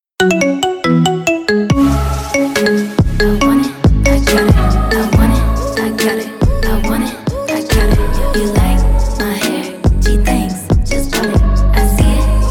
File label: iPhone (Marimba Remix)